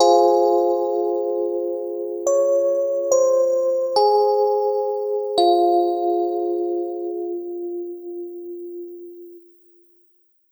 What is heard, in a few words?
106 Fm Rhodes, Melodic Loops; Electronisounds; Rhodesadelica